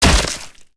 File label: Teleporter Blood Splat Swt; Evolve; Wraith